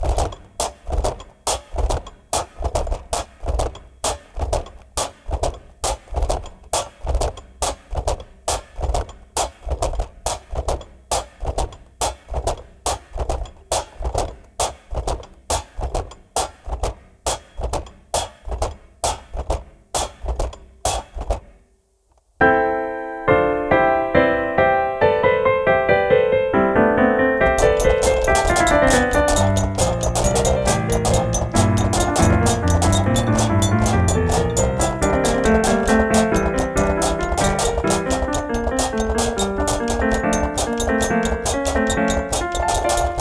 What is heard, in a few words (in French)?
version batterie preparee et pianoforte